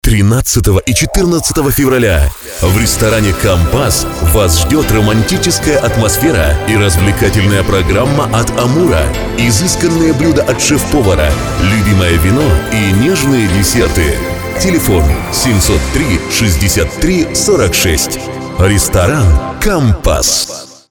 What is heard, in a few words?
compas romantic; Clubs